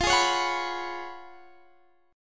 F sharp Major 7th Suspended 2nd Suspended 4th, F

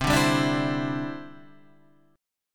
D 7th Suspended 2nd Suspended 4th C

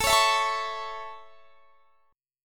A Major A; strummed; x,24,23,21,22,21

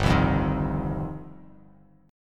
G 7th Suspended 4th Sharp 5th, Bass Standard

